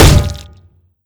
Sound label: metal hit, impact metal